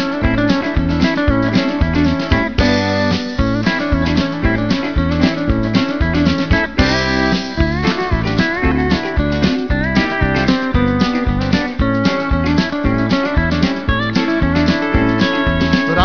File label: solo, steel gitar; Her er lydprøve på solo